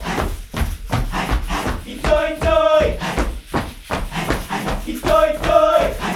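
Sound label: 80 bpm, group chants; Spectrasonics; Supreme Beats; African Contemporary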